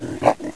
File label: Beetle Attack